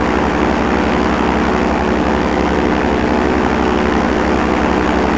helico; svencoop; sa13

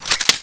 reload left; svencoop

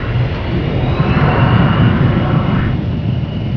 buzzer machine; svencoop; russian sounds

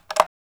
sniper bolt down, weapons

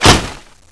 spear hitwall; svencoop

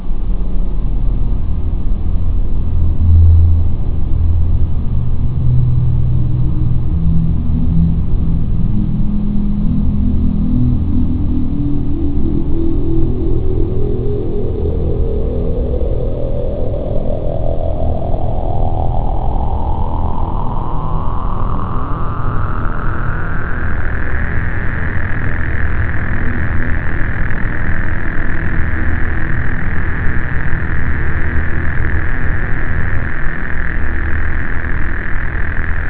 forest song

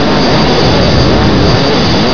particle

